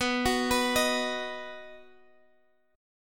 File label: Dsharp Minor Sharp 5th